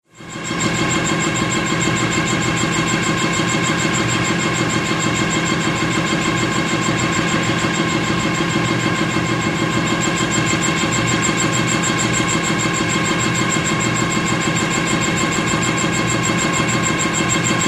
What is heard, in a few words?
MACHINES - CONSTRUCTION & FACTORY MULE: EXT: Panama canal mule, engine & air compressor runs & stops.; air compressors